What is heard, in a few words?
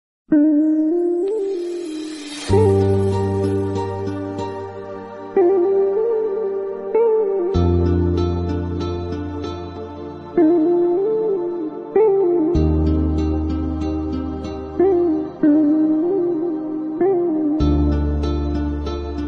Turkish Islamic Background Music